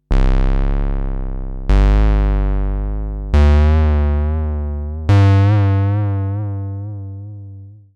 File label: SINGLE OSC MOD Moog Model 15 iOS